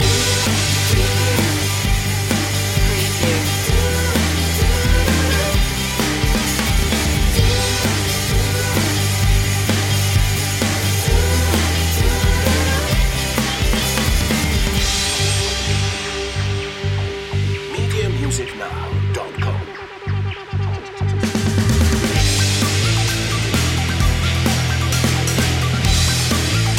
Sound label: break out loop